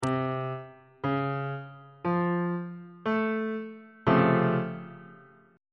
c sharp augmented major 7th 3rd inversion on bass clef